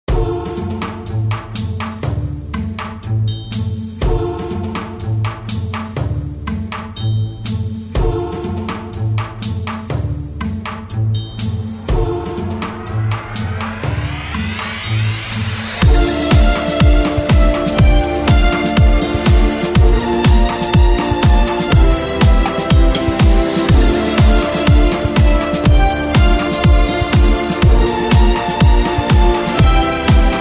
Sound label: ballet warm down